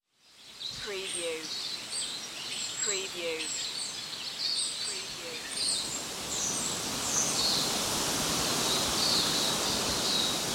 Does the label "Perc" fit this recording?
No